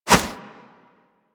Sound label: Punch Whoosh; Warframe; Weapons; Infested; Inf Kick Punch